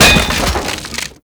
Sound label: Wood Bench Destroy; Ambience; Props